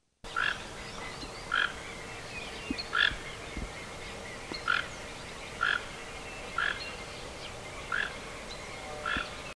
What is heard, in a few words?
tucan, Tucán Grande, Ramphastos toco, Toco Toucan; cantos; PICIFORMES; Ramphastidae